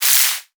Multimedia Internet CD-Rom Flash Wipe Short

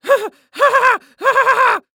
Cartoon Human Male Laugh Nervously Sarcastic

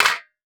Impact Pan Tin Hit By Small Metal Pipe; Impacts

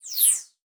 Multimedia Internet CD-Rom Flash Blast

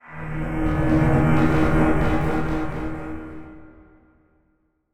Transition Delay Medium

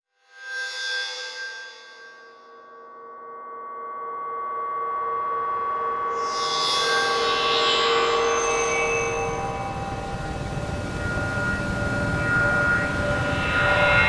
Electronic Whoosh Reverse Feedback Digital

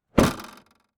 Transportation Jeep Commander Close Trunk

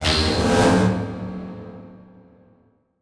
Transition Whoosh Alarm

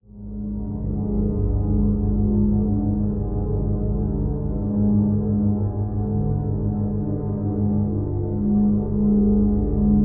Drone Musical Flutter Slight Flange; Production Element Imaging; AdobeCC

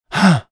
Human Male Middle Age Gasp

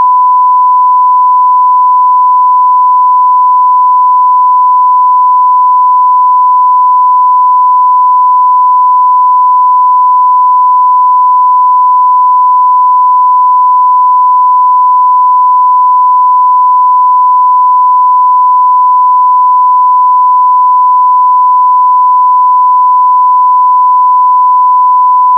1000Hz Tone, Test Tones

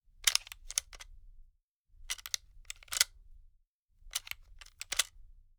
INT Unloading Magazine Singles, Guns; Coll Anderson; GDC Game Audio Bundle

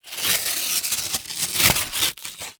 Industry Cardboard Box Tear Slow

